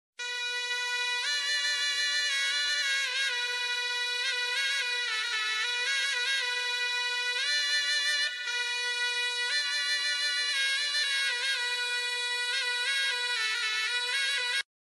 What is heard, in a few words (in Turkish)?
Telefon Zil Sesi; Soz Dizisi; Populer Telefon Zil Muzikleri